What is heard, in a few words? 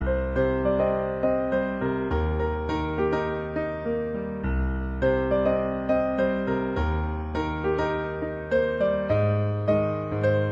jazz piano